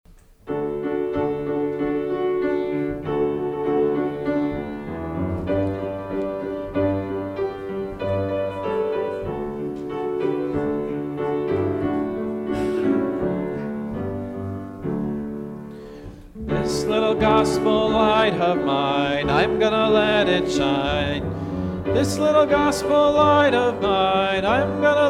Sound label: Hymn; This Little Gospel Light